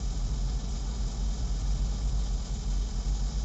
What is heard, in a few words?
Ambience Loop, outdoor